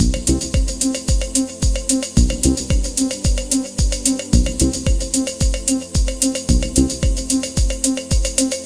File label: loop; Medio Volume 2